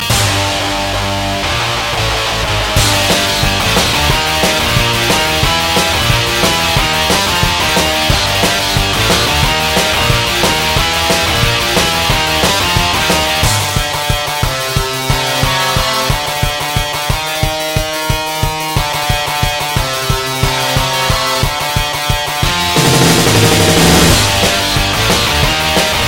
Metal; Zelda

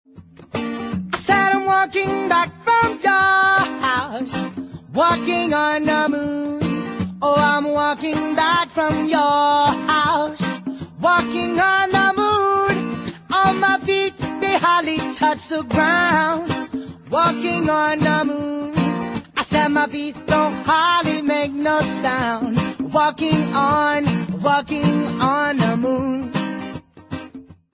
acoustic

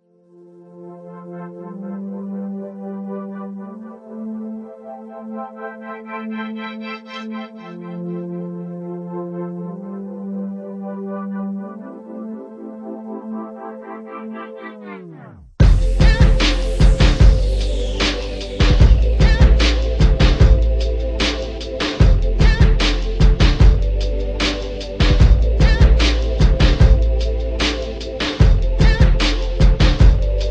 instrumental version

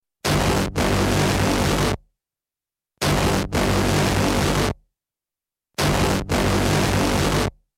spark

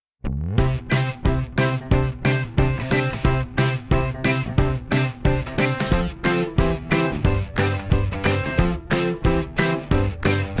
rain cloud chill

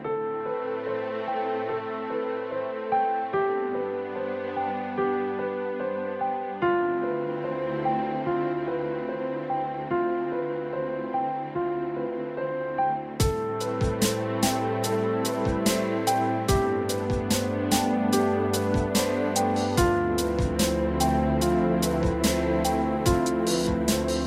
Atmosphere Ringtone

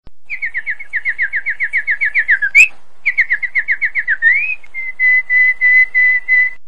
canary singing; animals

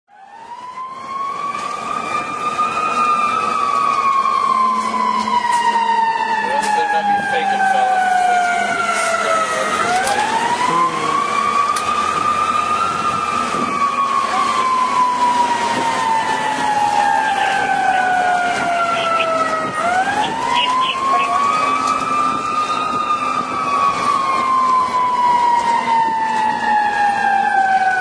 engine

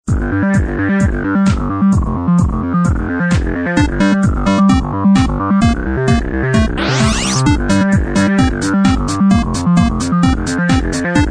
Retro, ringtones

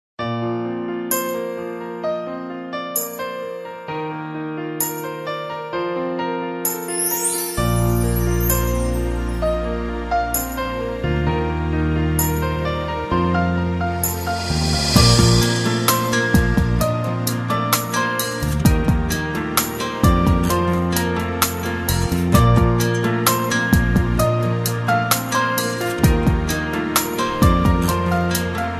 nice tune

